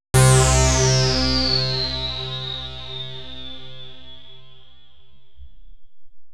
juno, Synth

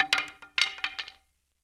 bow; kult

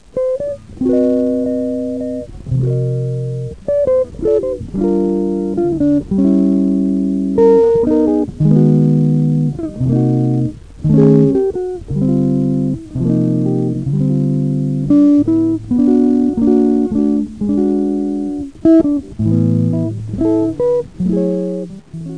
J guitar; WIREPLAY

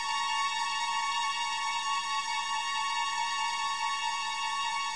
strings

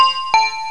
botan

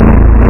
Indus; PCGamer50

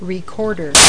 Recorder

